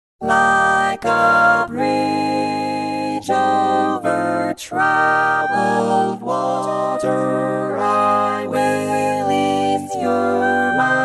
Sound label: All Parts, barbershop tags